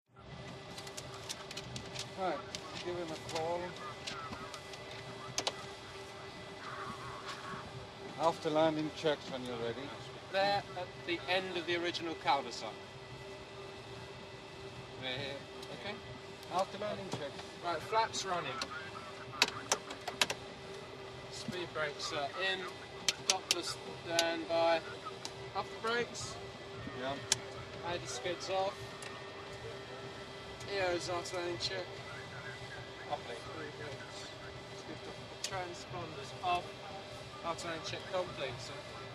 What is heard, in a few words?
Boeing 707 cockpit, after landing check list, taxiing - lots of wind bumps